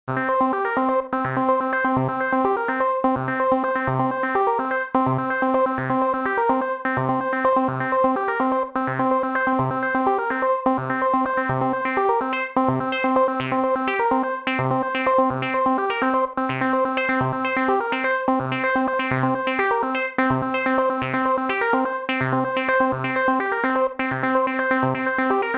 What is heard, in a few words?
arp Sequence Square saw